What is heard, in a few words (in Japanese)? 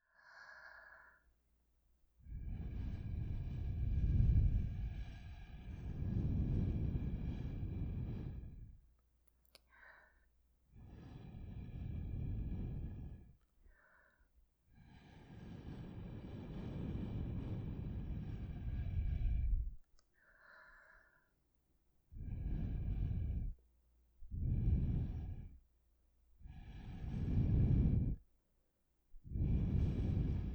ストローでお耳ふ～ふ～, ぐるぐるまわりながら